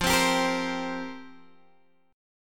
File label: F Suspended 2nd Suspended 4th, down, Guitar, Open G